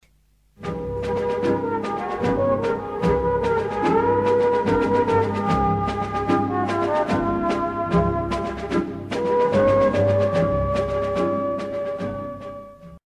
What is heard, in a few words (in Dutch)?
trombonefragment met glissando; Wel of geen glissando